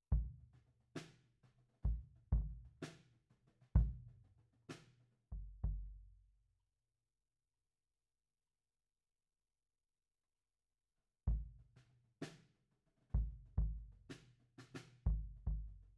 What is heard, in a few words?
Kick Out, 96bpm